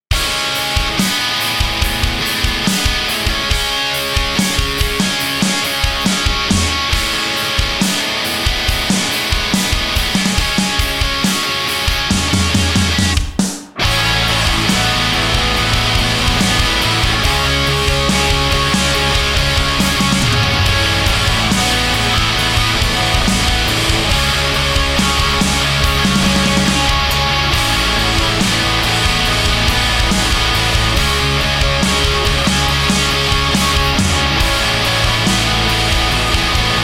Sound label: Guitar